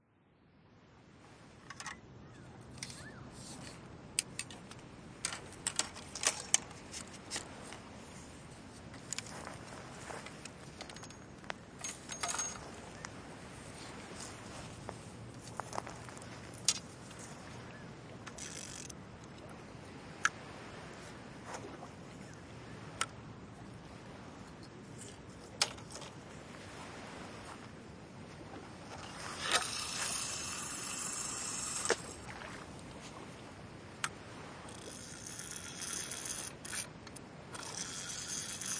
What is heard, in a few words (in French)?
Canne a peche Binaural